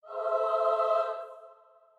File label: Choir Female Pad Pitch Up